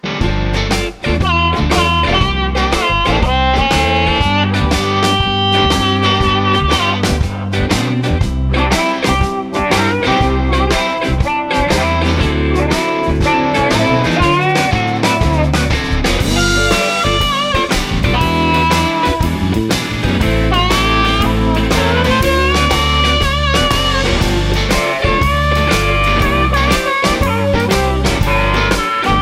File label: Hard Luck Funky Blues Shuffle